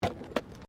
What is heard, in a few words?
Tram Horse Drawn Horse Step Gravel